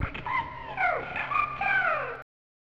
Secret Vocal; bakutou dodgers; snes

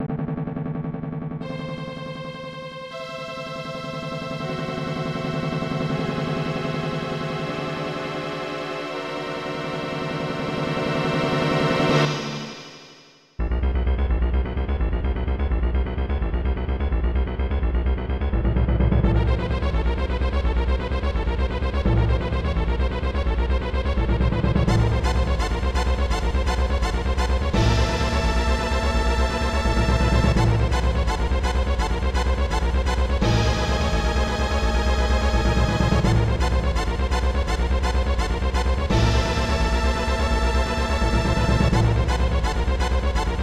Battle; Front Mission Gun Hazard; SNES

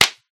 Comedy Cartoon Clap; Poppy Playtime; SFX Environment ProSoundCollection v1 3 MONO

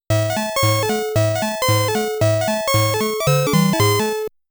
Game Start Music

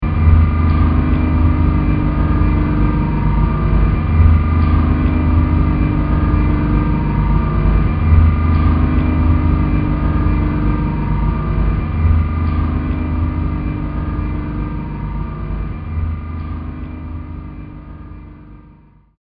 Elevator Ride; Fatal Frame IV

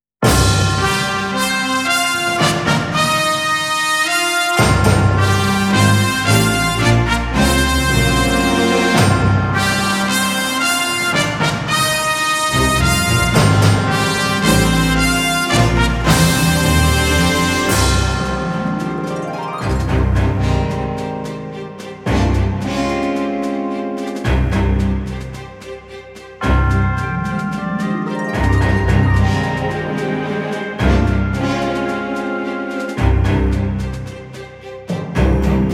Battle; Hanayakanari Waga Ichizoku Original Soundtrack

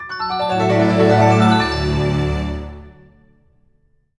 Jingle Level Up; Dr Kawashima's Brain Training for Nintendo Switch

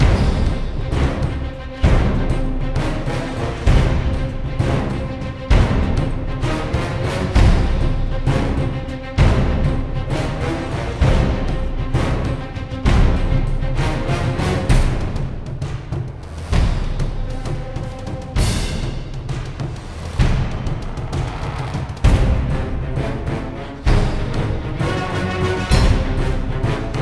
Bossfight Frantic; ryse son of rome 2014

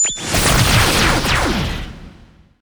Missile; Inotia 3; Wizard

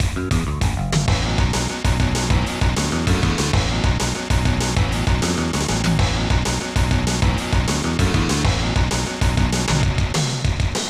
Rock Concert

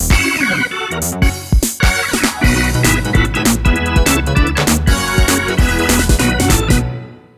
Jingle ~ Change Sides; mario super sluggers; wii

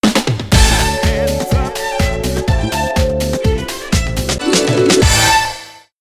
Disco; Disco Wreaking Ball; Skylanders Giants

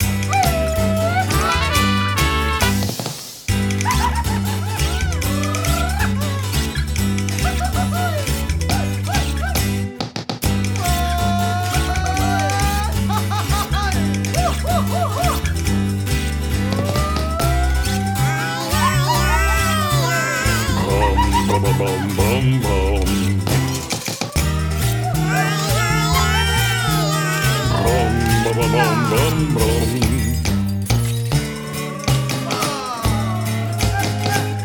You're on Fire! (Drum 'n' Bass); Gourmand Land

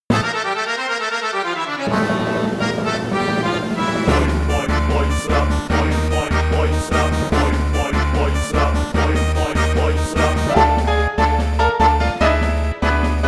Tango; Poison Slime Tango; Dandy Dungeon Odd Album

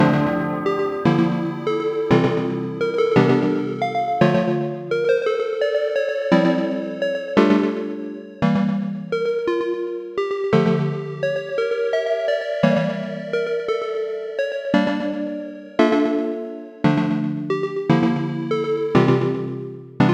Eternal Sleep (Game Over); Fight Knight

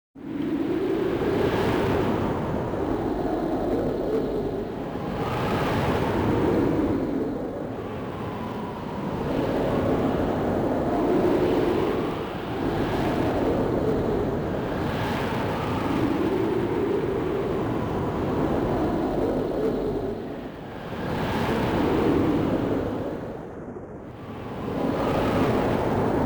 Stage Ambient Music; The Last Blade; Yuki Stage 2

